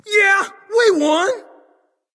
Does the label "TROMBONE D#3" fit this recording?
No